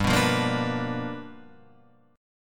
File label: G 7th Sharp 9th G chord, Guitar Open F; G7#9 strummed